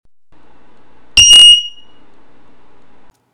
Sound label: beep